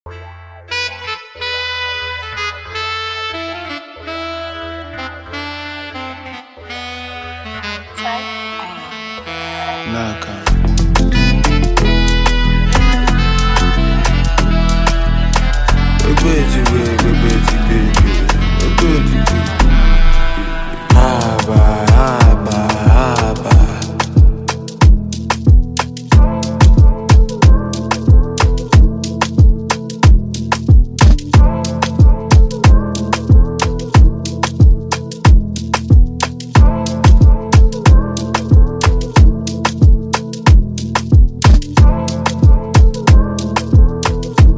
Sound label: instrumental